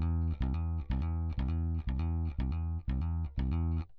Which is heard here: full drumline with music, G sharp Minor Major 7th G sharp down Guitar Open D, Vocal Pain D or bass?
bass